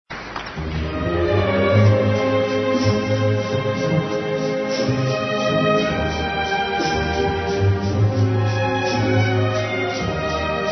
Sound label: blues